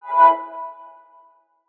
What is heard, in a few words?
Charging Started; HTC Desire 20 Pro